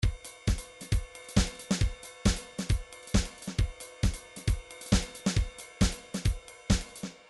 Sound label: trance midi loops beats ping pong; Newtronic Trance Constuction Kit